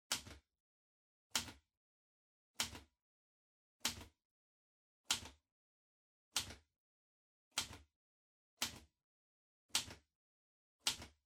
Typewriter Far Type Single

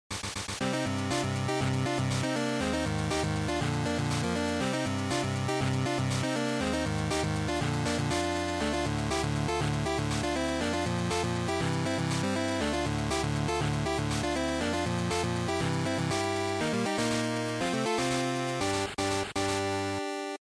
GB Fighting Title

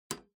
PC Hardware LAN Plug In

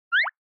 Anime Motion High

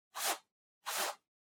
Tablet PC Swipe

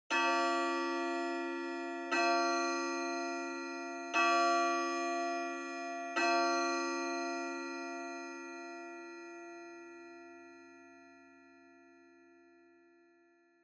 Pendulum Clock Chime Low

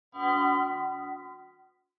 Horror Accent Mid Short

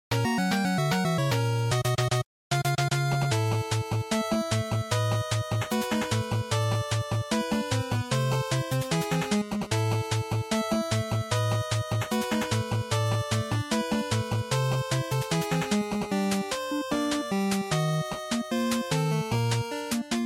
Shooter Stage, bgm

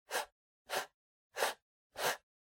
PC Mouse Move Wood Short

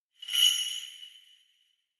Kagura Suzu

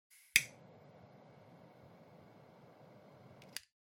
Lighter Click Jet

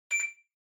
Washer Front Load Beep Short

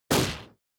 Motion Grab Strong; モーション 掴む; 強

